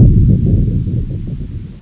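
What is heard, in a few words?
low rumble; quake2; coop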